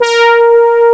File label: synbrass Bb4; Hexen Demo; Hexen Music; Hexen 6vox